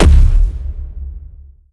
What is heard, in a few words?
step layer, dog; npc; scripted